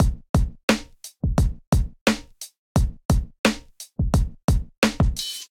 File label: Kit, hip-hop drums; Two Worlds; Ableton; Golden Era Hip-Hop Drums; Sound Oracle